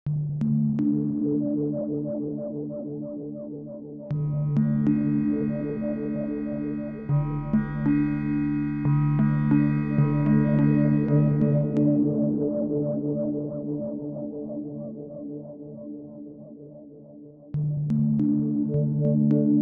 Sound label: Polytone morph; Reason 13